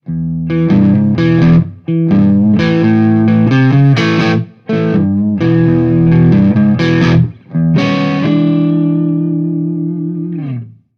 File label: Blues, ringtones; Marshall; London KB 1501